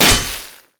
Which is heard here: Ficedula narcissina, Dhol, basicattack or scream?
basicattack